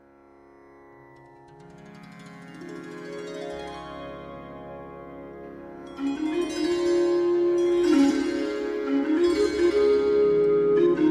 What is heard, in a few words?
chants